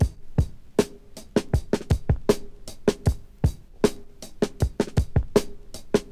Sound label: 78 bpm high quality drum groove b key; drum loops